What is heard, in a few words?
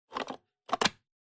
Pencil Sharpener Close